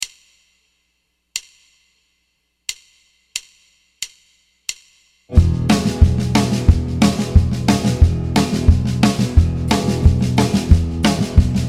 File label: Guitar Vamp E